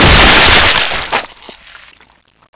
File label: sp die, tarantula; svencoop